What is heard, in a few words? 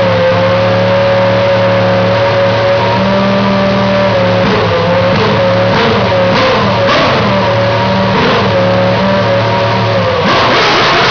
renault r25 bripping